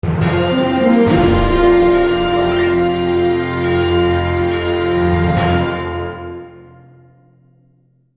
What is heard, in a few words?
game start; greevil